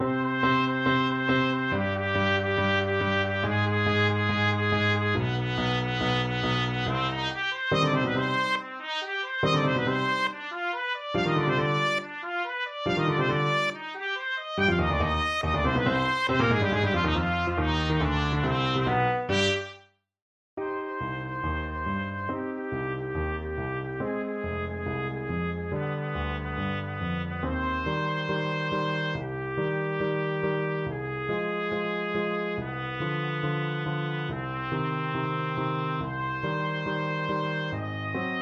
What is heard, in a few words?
trumpet